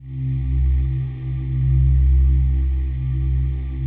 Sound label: digi pad, Pads